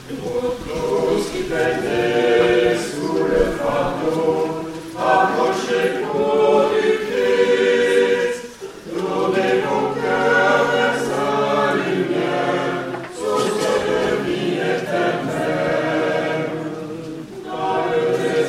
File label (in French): chant entree